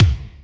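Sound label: kick Stab RM, Alesis D4 drum machine; 300 Drum Machines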